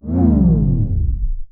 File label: Tape Stop